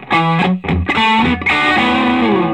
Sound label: SOLO, Vintage Blues Guitar; USB Soundscan vol.22